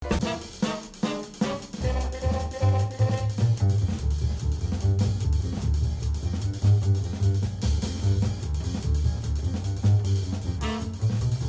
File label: music track; Ed Edd n Eddy